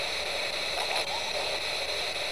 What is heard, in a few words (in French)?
son bizarre